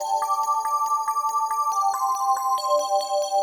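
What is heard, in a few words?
synth electro 140 A; Techno; Keys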